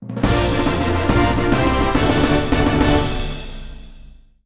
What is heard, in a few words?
Jingles Victory; Selenophobia